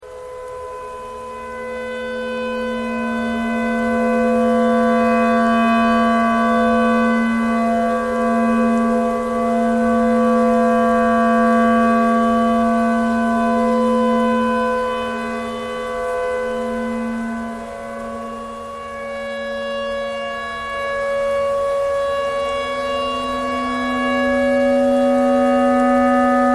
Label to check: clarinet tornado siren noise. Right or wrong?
Right